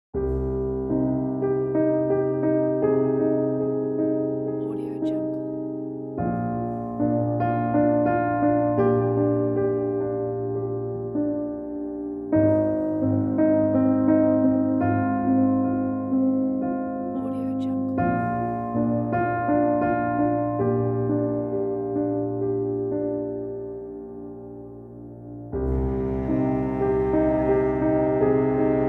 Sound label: sad piano